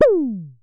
Synare Filter; Star Instruments Synare 3

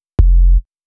Sub Bass; Cinematic Funk; Sonic Foundry (Sony Creative Software); Cinematic Funk Urban Tone Poems

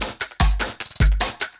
LOOP, Drum & Bass, 150 BPM

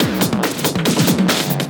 Fills, Loops 140 BPM

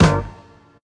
HIT, Hits; Houseworx

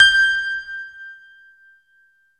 JV E GRAND, JV-1080, PIANO

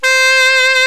SAX COMBO

